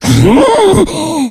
death, infected

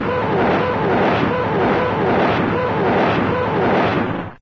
nebwerf dist; ambience; Source Engine; dod

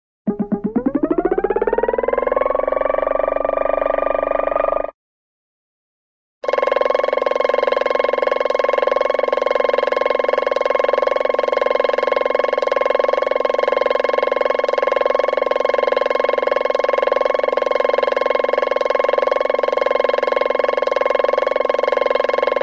sci fi jet pack start, lift off; cartoon

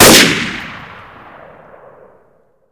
scout fire, weapons; Source Engine; cstrike

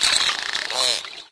underwater minimize; windows 9x; sound schemes

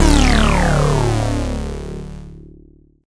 tank start; video games; source engine; tf; mvm

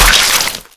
zombie blood spray; player; splat; source engine; left 4 dead 2; survivor

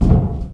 duct, footsteps, survivor, run

